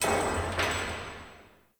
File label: Under City Distant Metal Clang Ring